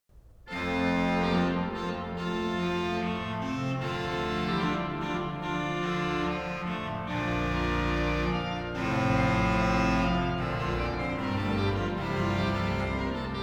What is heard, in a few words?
cromorne, organ